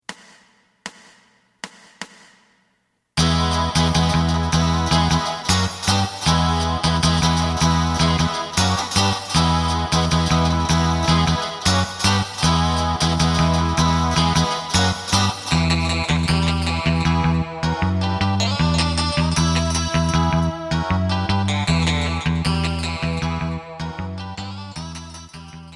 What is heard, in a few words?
drum